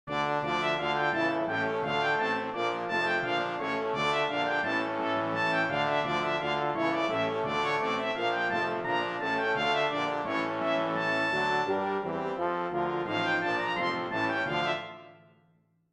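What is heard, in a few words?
TRAD Brass D minor piccolo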